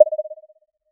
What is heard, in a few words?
soft plop synthetic